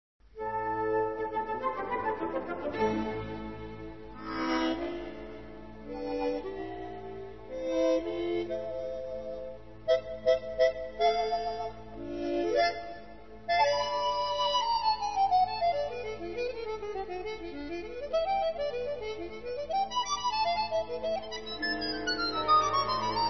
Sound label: Romanza